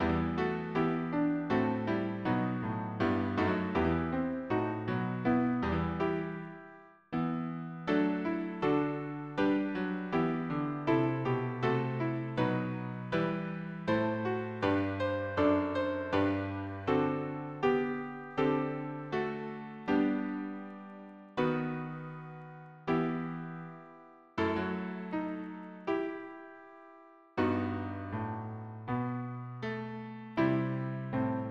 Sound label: harmony piano